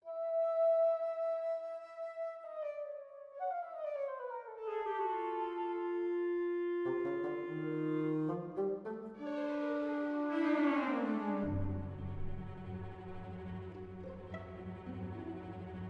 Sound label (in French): orchestre audio avec voix; orchestra e voci sintetiche